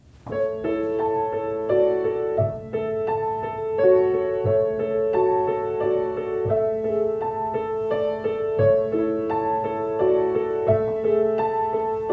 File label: treble piano